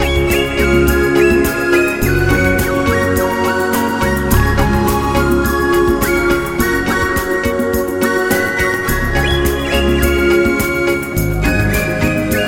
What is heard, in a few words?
Beautiful Island Dancing